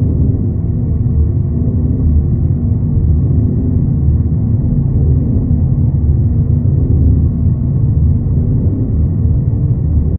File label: crafting ambience